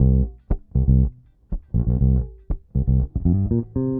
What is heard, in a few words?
Bass, 70s Analog Funk